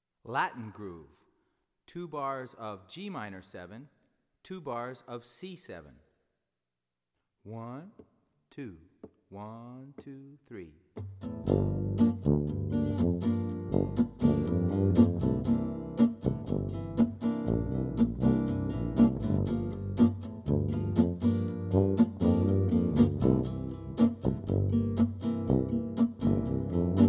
Latin Groove, Gm7 Gm7 C7 C7

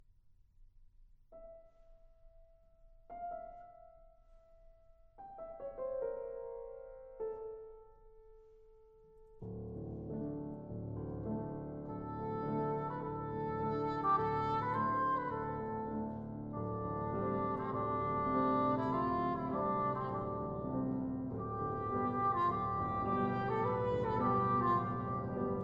Vocalise, Trumpet Vocalise

